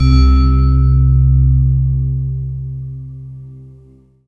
World of Synthesizers, SYNBELL

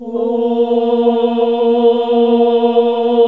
OHH A#1, CLASSIC VOX

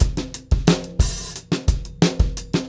089, Beat Loops; Zero-G - Monster Beats

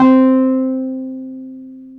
PNO T.Wurly C 5; Keyboards of The 60's and 70's

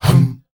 HUM HUM, World Voices, S.AFRICAN; ILIO; Vocal Planet

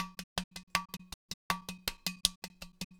Udu

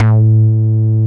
HOLLOW BASS; Masterbits; Dance Trax

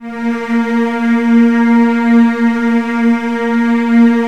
Virtuoso Strings; ILIO; Kirk Hunter Virtuoso Strings VOL-3; 90 s Sample CDs; LRGE STR ESP

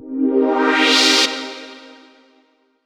Stab C3, Club Techno; Zero-G; Stabs

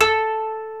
KOTO A4, Earth Tones

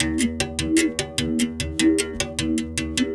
Tar, World Percussion

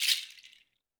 percussion, Roland TD-20

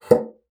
BASSOON; Xsample VOL-6

